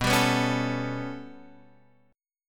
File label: B 7th Suspended 2nd Sharp 5th B down Guitar Standard; B7sus2#5 strummed; chord shape; JGuitar